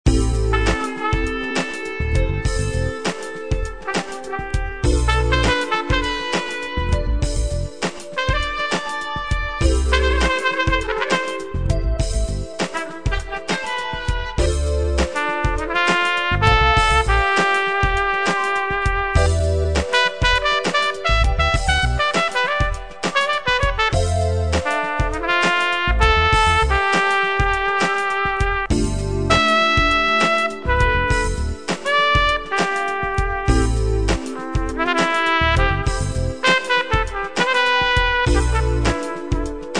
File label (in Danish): Funk akkorde, tromp